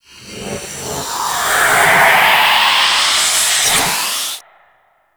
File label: Hull Breech Pressurize; Warframe